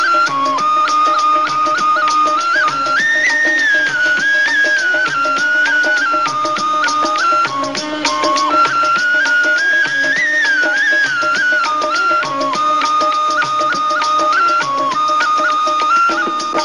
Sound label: nice flute; classical